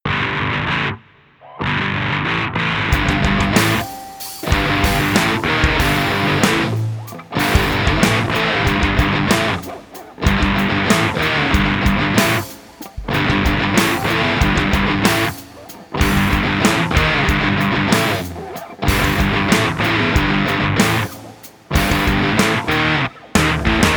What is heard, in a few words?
noise; noise endeavor; weekly beats 2020